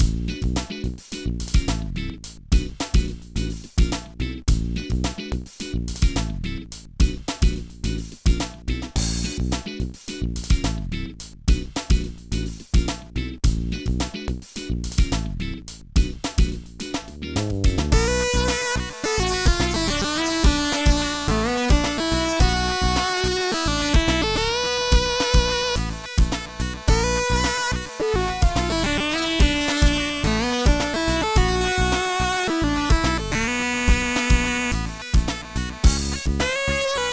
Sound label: funk break